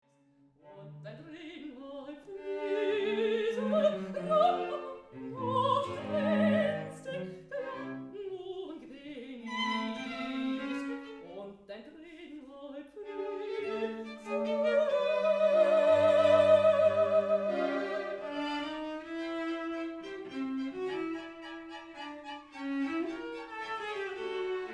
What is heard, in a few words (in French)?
valse alpestre